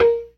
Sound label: PERC, ORGAN; Keith Emerson Signature Series